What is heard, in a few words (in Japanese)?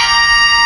携帯音; ドナルド